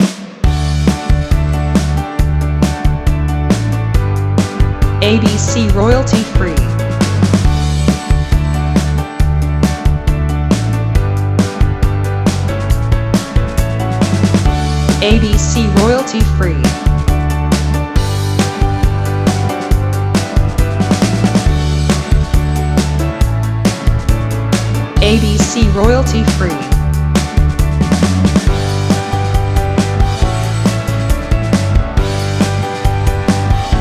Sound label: Upbeat Piano Pop